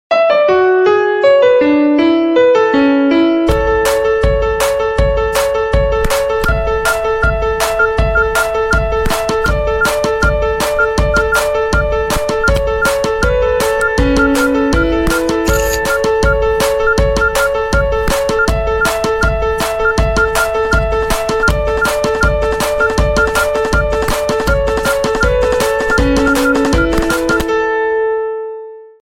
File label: nokia ringtone remix ringtone